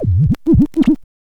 scratch kit, vinyl

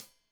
Hat Tight, Hi-Hat, Cymbals; Deeply Sampled Drums

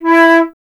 flute E

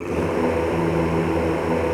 E1, Extended Classical Choir; Best Service

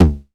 909 TOMS

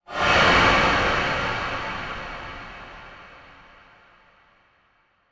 fx creepy

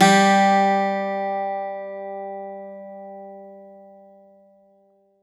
bouzouki

